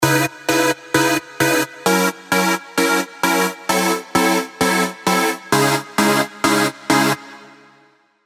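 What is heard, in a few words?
synth Vocaloid, Spire; spire old skool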